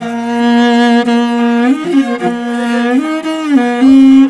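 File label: VIOLI LP, MORIN violins, Asian Traditions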